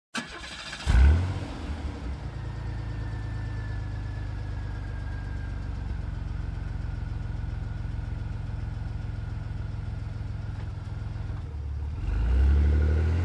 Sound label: Car engine ignition and drive away